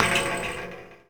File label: Street Ball Metal Back, Street Basketball